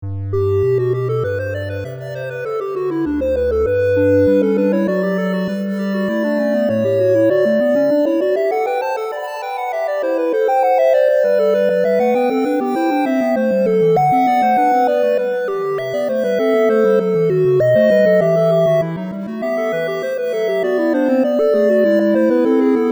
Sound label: for synths; 15 Sinfonias, BWV 787-801; Bach, Johann Sebastian